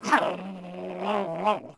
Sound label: grrr, Dogz